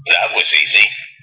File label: EASY Button